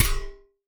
war ui source drop corner jagged sheet metal on ground snares; resonance